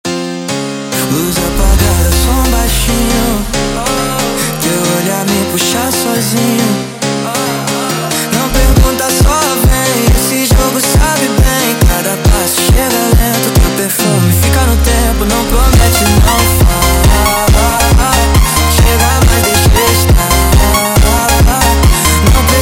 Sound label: Montagem sped Up